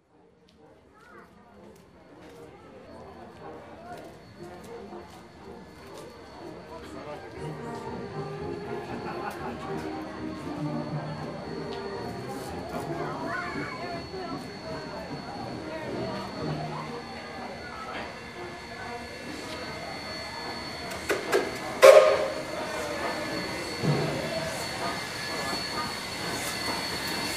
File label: Arr Warwick, steam; Q150 Steam Train